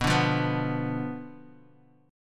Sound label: B Diminished B down Guitar; Bdim strummed; JGuitar